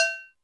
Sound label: Anvil, Drum Machines Alesis D4